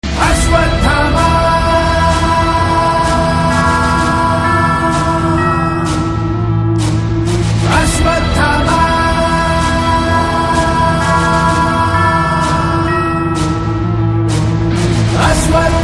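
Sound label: Title background music Telugu Ringtone; Aswathama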